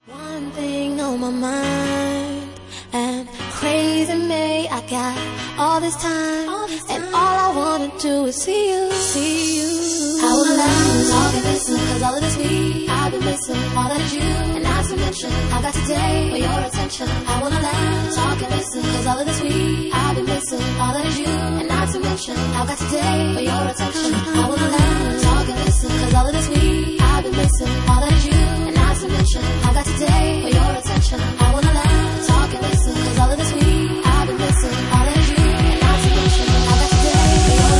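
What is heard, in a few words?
Organ Mix, Bassline House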